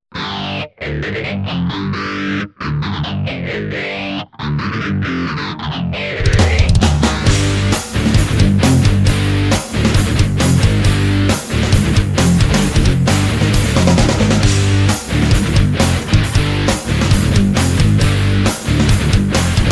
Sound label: Guitar Track